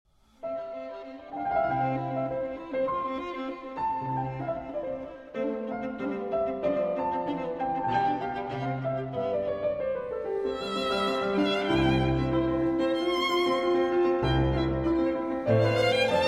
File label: Violin Sonata in C Major; K. 296; Mozart; III. Rondeau. Allegro